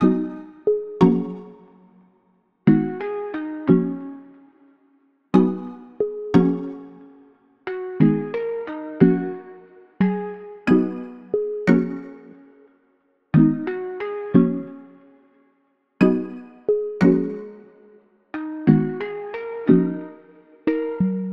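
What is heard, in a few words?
90 melodic stack B major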